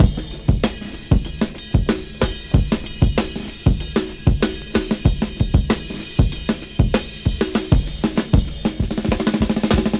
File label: 95 bpm breakbeat sample G sharp key, kick tuned to the G# note; drum loops